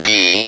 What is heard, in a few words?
speak and spell D; ALPHABET